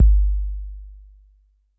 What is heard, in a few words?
808 BD, TR-808; Crypton - DrumBase5000